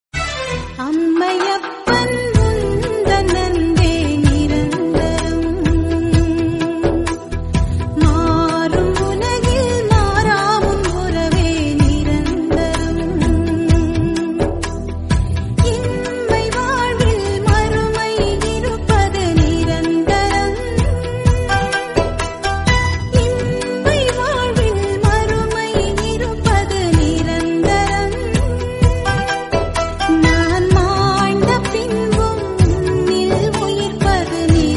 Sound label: tamil christian song